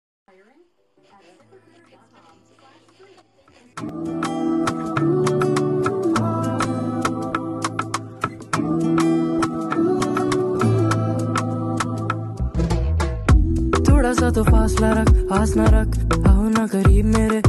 new song ringtone

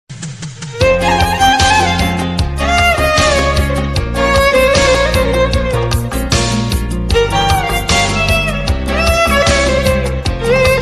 arabic magic ringtone

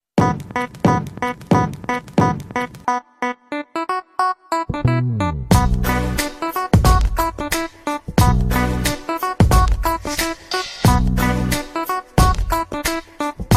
growl ringtone; iphone

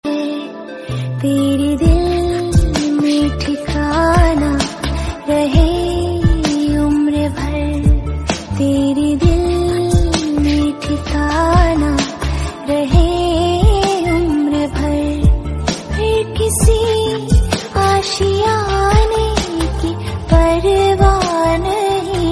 romantic song tone ringtone, bollywood ringtones

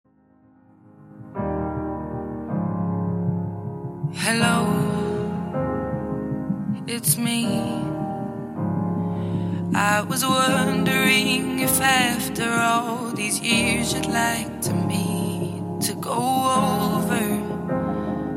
piano ringtone

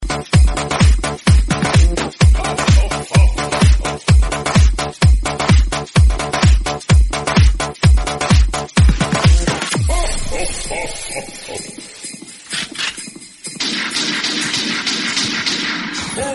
funny hip hop background music tone ringtone; funny ringtones

